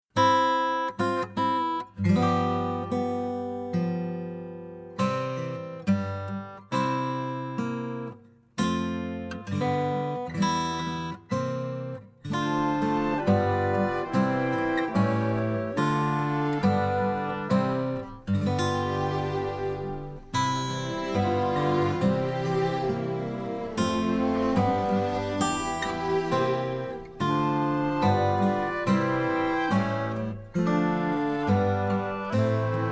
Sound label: Instrumental